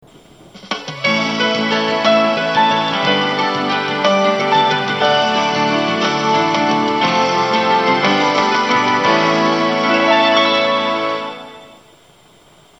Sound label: Kyushu chime